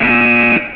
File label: Buzzer; Wacky Wheel!